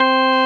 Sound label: KEY, Organs; Club-50 - Foundations Roland